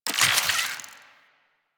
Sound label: Alchemist Infuse Viral UI; Warframe; DE; Warframes